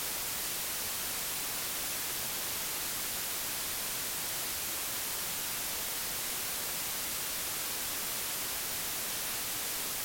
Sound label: TV Static; Audiio